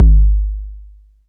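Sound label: bass drum 808 Sat Mid Decay C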